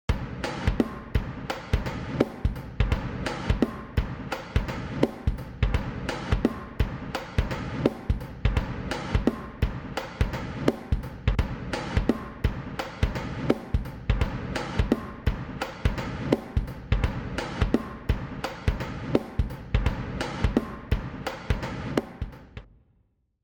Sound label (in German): drumloop spielwiese